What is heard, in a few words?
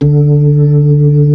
B-3, Club